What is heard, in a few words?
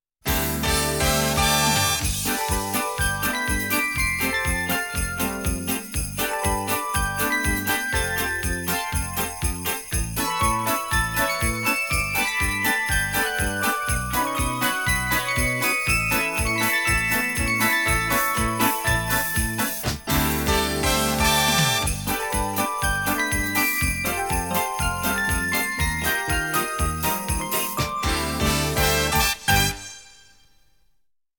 Christmas Filler, jingles